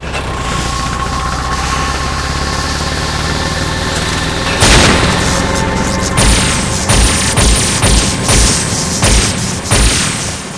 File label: evil machine attack